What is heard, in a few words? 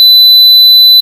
piezo long single; digistuff; dreambuilder modpack